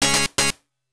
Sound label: Goal; Rough Racer; System 24; arcade